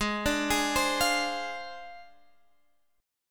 G sharp 6th Flat 5th, Guitar, Half Step Down

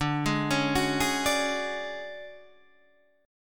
D Major 7th Flat 5th D down Guitar DADGAD

